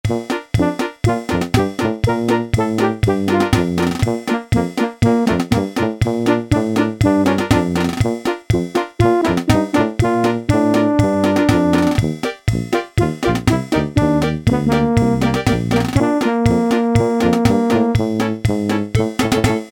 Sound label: MARCH, Yamaha SHS-10 Factory style demos